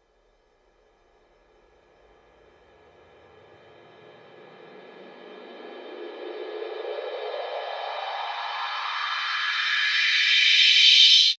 FX Uplifter

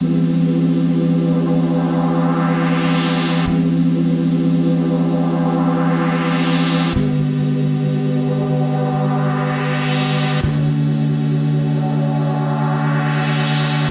Bpm138 Pad Loop CGD, Dubstep Chord Loops; Light

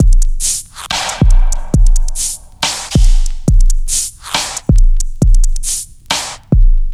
Bpm138 Field Break, Dubstep Drum Loops

